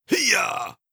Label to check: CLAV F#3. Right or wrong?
Wrong